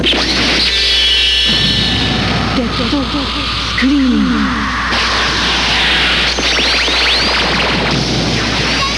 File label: dead scream